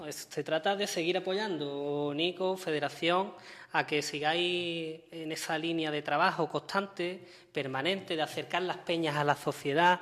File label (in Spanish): Flamenco